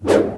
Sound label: attack twohand sword